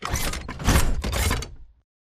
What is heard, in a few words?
alert idle to walk forward; Titanfall 2